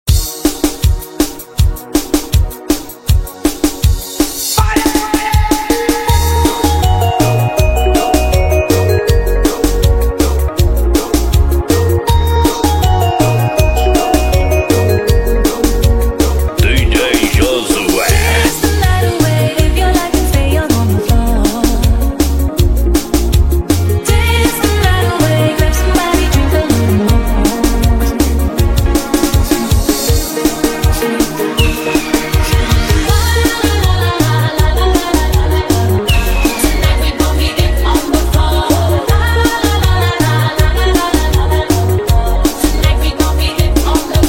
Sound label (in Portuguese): TECNO BREGA; tecnofunk